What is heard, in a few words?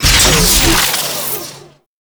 Lightning Deactivate, Forest; Veilbreaker; Grineer Forest Thumper; Kahl